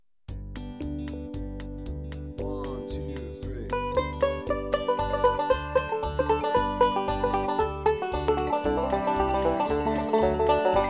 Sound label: banjo signal